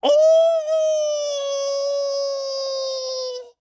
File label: death; Gorb